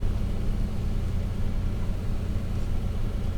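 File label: IDLING CAR, DRIVING; 1000fx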